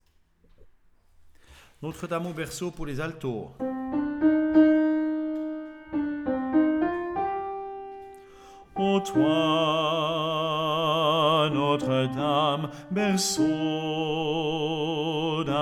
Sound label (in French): Chants Notre Dame au berceau A